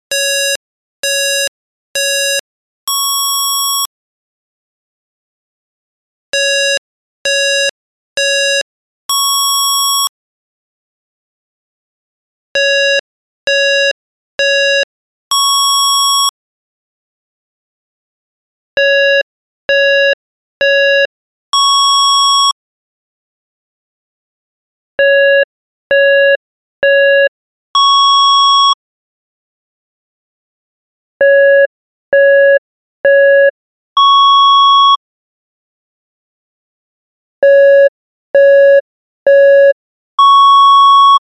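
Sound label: Start Race Tones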